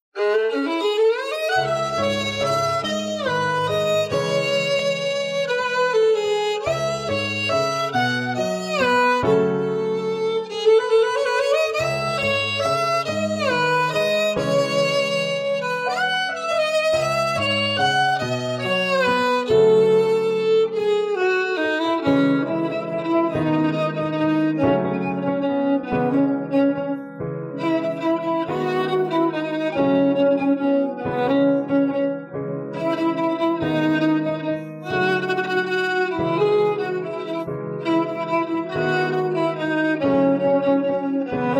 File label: Violin; ویولن نوازی میم مثل مادر